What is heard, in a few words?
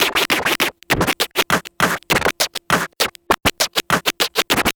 Vinyl Scratch Clap